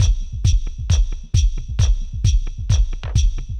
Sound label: thump 133; Loops